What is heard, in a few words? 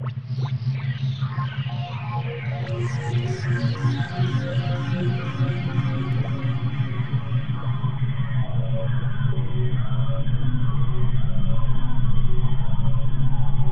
cyber loop; Dystopia